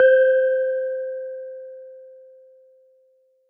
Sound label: Hit Coil Upper; faradays-law